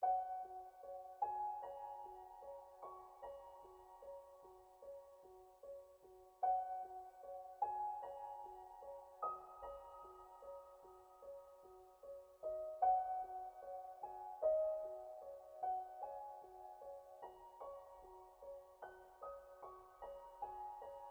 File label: Mystical Glades Piano Loop